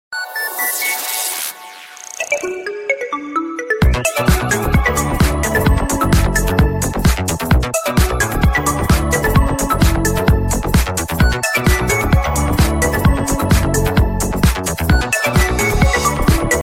nokia lumia new ringtone

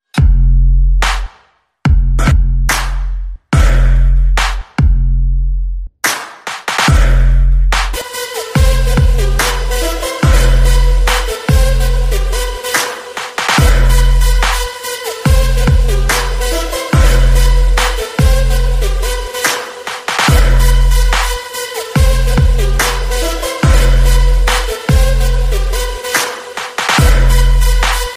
english ringtone

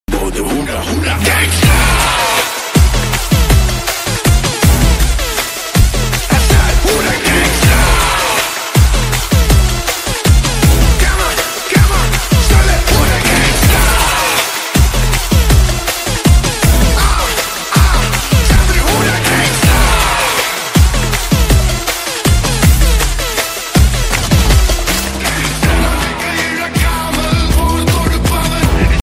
hip hop song tone ringtone, bollywood ringtones

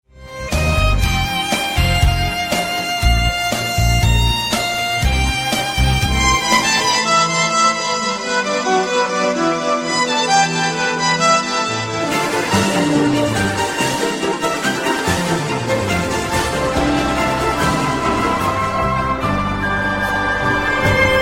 sweet classical ringtone

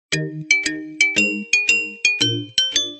xiaomi 12x sms ringtone

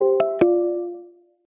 nokia ringtone; nokia salacia; nokia ringtones

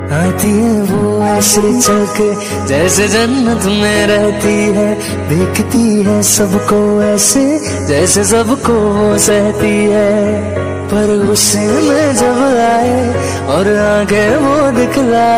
hindi latest bollywood ringtone

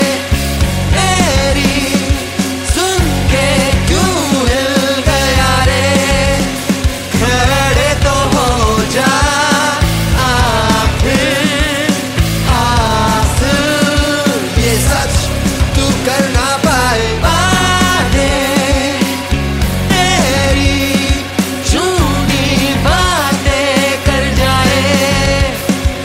music ringtone bollywood ringtones

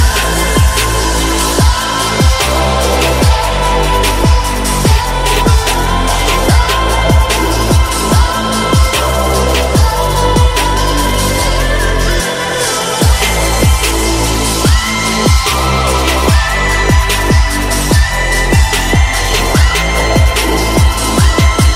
arabian music ringtone; bollywood ringtones

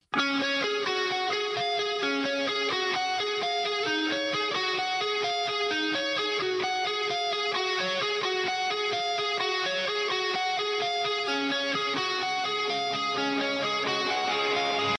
guitar solo ringtone

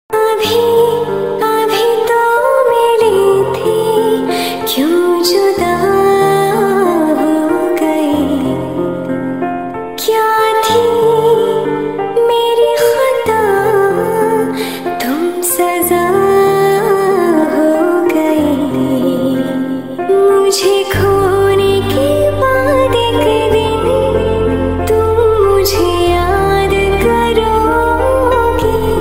female version ringtone

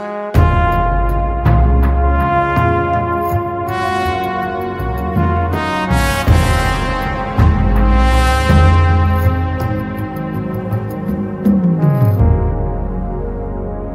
hindi ringtone, bollywood ringtones

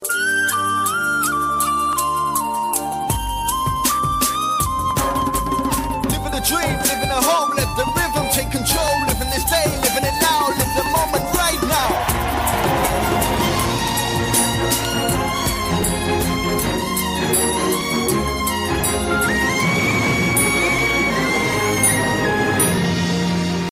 airtel ring tones

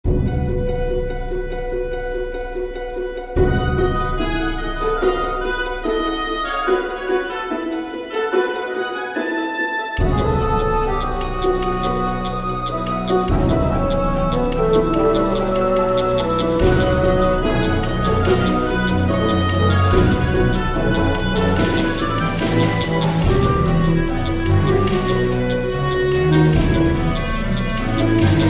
suspense movie ringtone

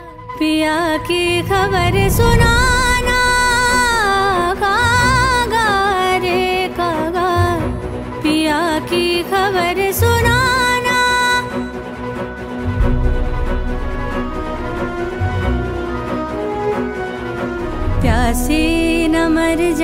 ringtone bollywood ringtones

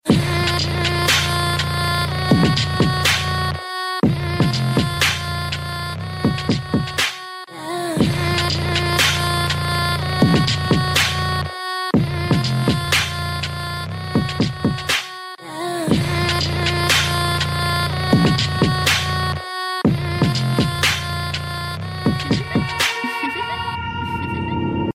gangsta beat ringtone

